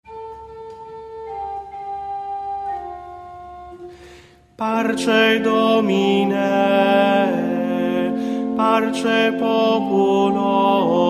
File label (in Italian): Canto Gregoriano; Parce Domine